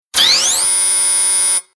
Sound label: Future Telemetry Static Button On Interface Buzz Space Scifi; Tom Hutchings